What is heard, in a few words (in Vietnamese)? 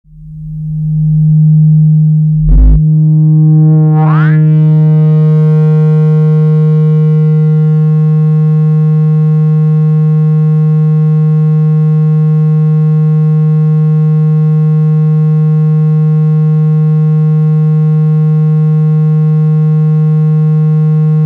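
Nhạc làm sạch loa điện thoại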